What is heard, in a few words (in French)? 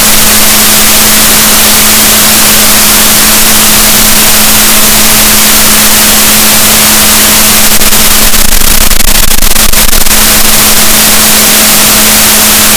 rorquals negatif, Balaenoptera Physalus; gaussian noise; SNR -6dB